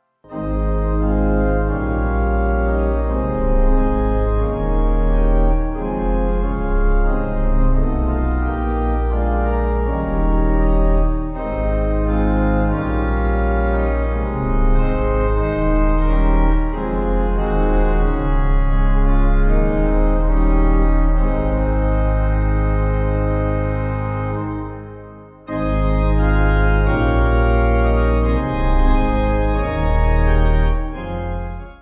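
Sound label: Pipe organ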